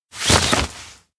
umbrella open spread; aino; anemoi

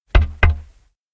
window knock; anemoi

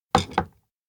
miso bowl place; rikka; anemoi パッケージ版

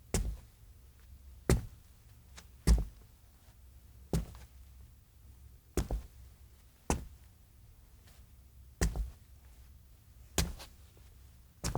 steps indoor hall wobble; anemoi パッケージ版 1.1

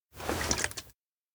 canteen lift; anemoi

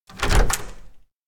door loghouse living open; anemoi

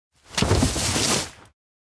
muddy slope slide; anemoi パッケージ版

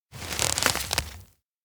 remove fingers umbrella; chiharu; anemoi パッケージ版

